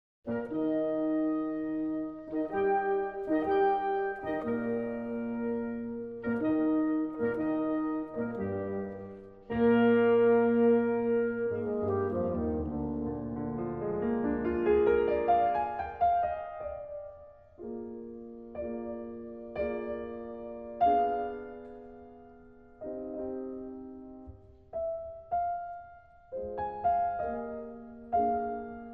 Quintet in E-flat Major, Grave, allegro ma non troppo; Ludwig Van Beethoven